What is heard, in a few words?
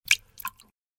Spray, notifications; Infinix; XOS 10